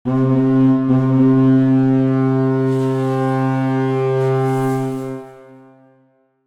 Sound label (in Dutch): Boot Scheepshoorn